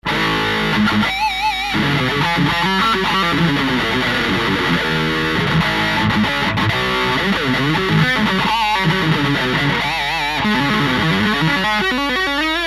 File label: metal sg vox ac30; line6; tonecore; Ubermetal